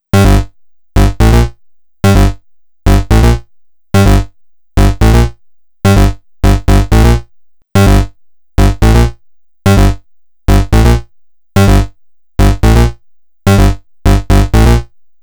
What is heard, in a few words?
126 House Bright Bass Gmin; Dance DJ; Digidesign; Pro Tools; Pro Tools Essential Loops